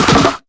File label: melee spin; wukong hero